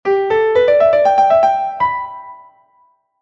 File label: sax a gogo